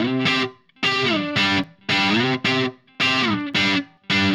Guitar, Indie Rock

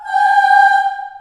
G4 child AH, choir; CHILD AHS

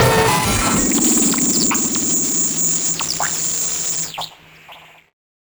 Malfo Syn, malfunctioning synth

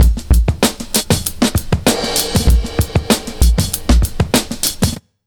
97 Beat, Underground Hip Hop; USB Soundscan vol.02; AKAI

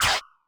Mega Drums, Korg 01W

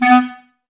clarinets, Wood Winds

